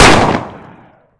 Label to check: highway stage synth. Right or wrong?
Wrong